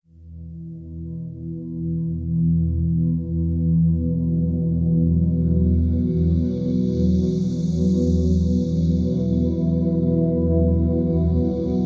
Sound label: Space Trance